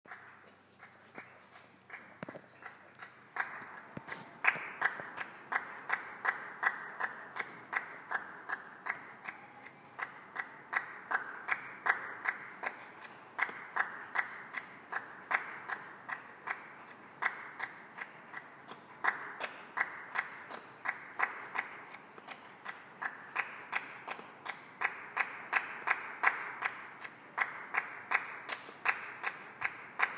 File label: lonely applause